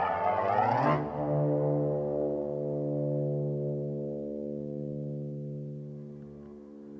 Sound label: wish sms; ringtones